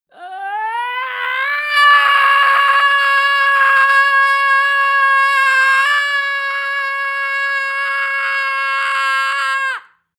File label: female scream loud long painful; schreie; menschen